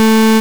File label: error; panicpumpkin